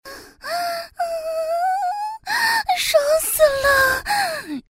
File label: moan; Imperial Girls